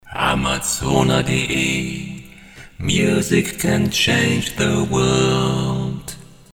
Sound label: tc helicon perform ve sampler